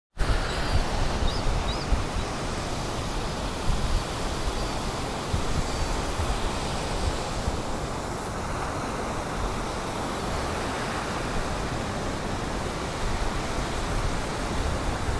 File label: piha beach auckland